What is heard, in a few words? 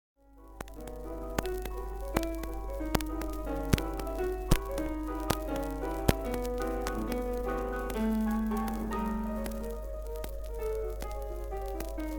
damaged 78 piano solo; Restoration